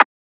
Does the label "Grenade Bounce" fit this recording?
No